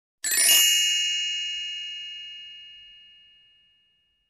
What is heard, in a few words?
Granted wish glissando sound